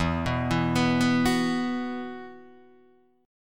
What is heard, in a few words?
E Suspended 2nd, Guitar Open D; 2,2,2,5,2,4